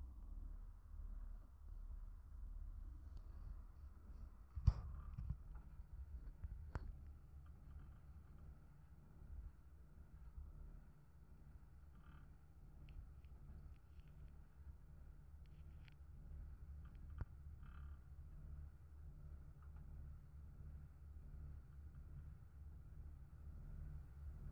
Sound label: Ferry Vibrations